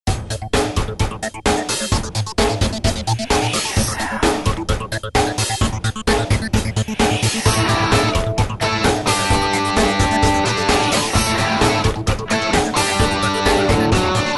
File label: U Turn - 130BPM, rock